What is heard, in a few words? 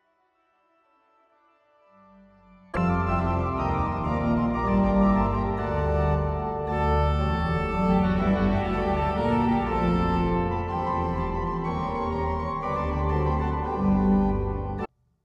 Tuba Tune